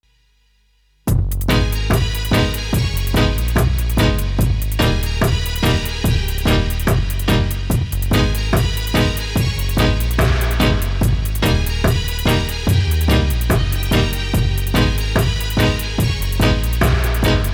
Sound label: dub mix